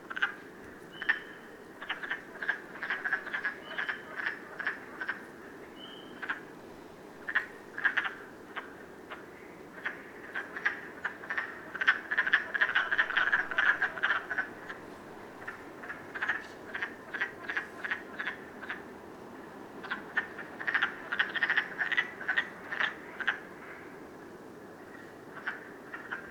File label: woodfrog, frog calls; amphibians; terrestrial